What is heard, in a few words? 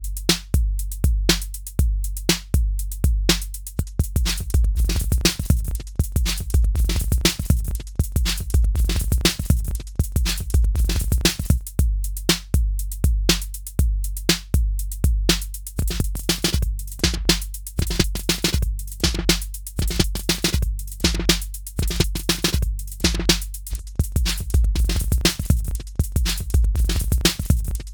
Sequencer Beat; Bastl Thyme